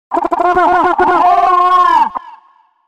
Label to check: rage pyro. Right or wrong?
Right